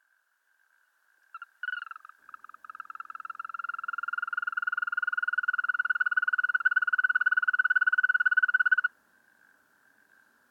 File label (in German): Wechselkröte, Stimmen; Amphibien; Froschlurche; Oliver Swift